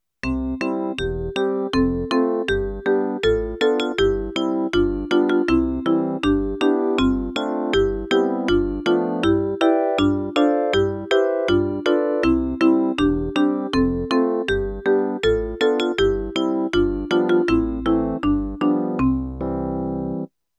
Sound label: School Music Play Canon; Kortjakje